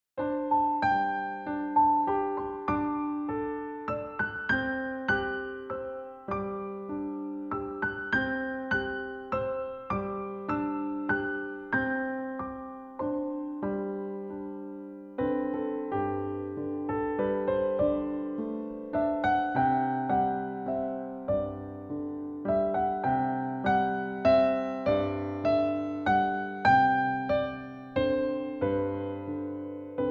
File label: the first noel piano